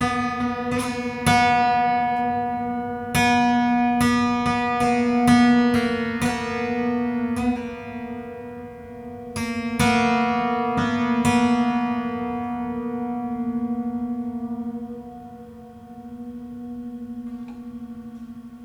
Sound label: Detune, Piano; Piano Destruction